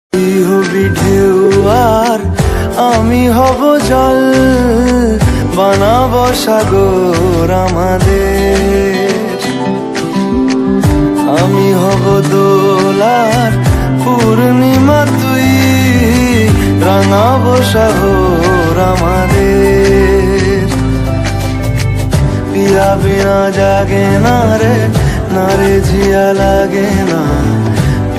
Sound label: bengali ringtone